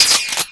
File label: Pickup Ammo; Pickups